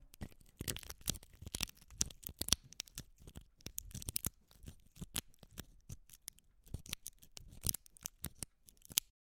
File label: Bone Cracking Or Crumbling